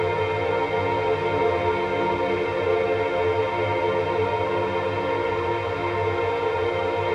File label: void orchestra drone; ゆめ2っき